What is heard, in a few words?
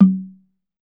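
TUNG LO, Tongue Drums, Pitched Percussion; E-MU Producer Series Vol. 7 – Old World Instruments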